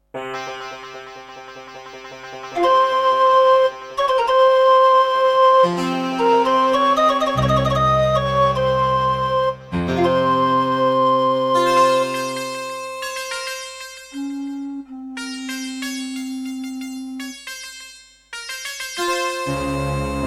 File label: Yamaha TG100; Prince of Persia 2 - Intro